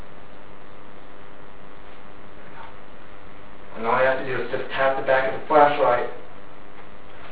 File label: whisper